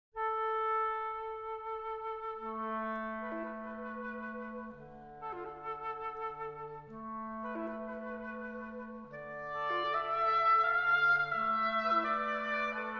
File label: Divertimento for Flute, Oboe and Clarinet, Andantino; Op.37 IV.